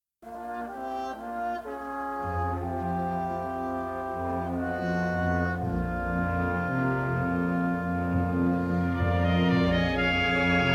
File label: w verb; Popsicles